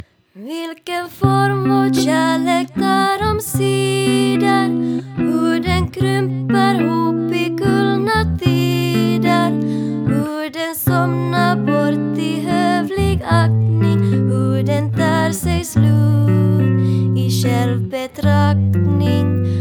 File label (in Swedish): sopran